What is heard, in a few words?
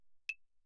clave, hand percussion; claves